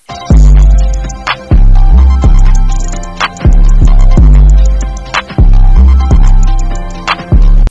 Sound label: drip car